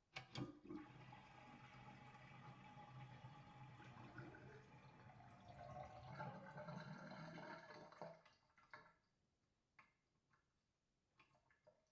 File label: sink drain far